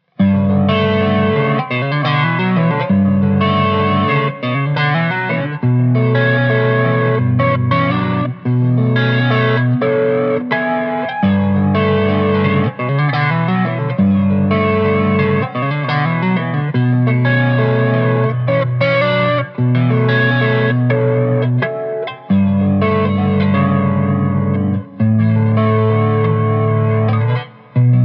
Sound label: FACGBE